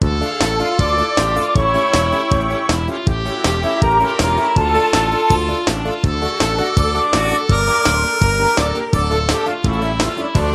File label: Greek Instrumental